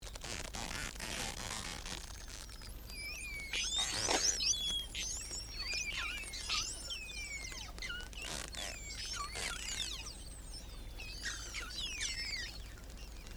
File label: Pilot Whale, Voices in the sea